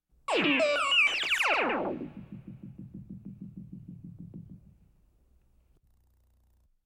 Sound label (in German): Science Fiction Kosmische Mutation